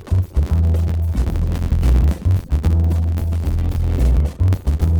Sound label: Latino